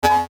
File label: Beep Electronic Hum Whoosh; Production Element Imaging Element Accent Transition; Multimedia; Beeps